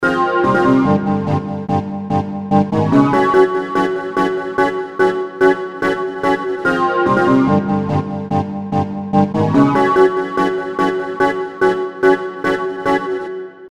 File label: Chord Walk, Chords; Industrial